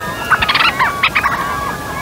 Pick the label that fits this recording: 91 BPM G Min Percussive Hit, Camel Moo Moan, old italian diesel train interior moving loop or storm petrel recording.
storm petrel recording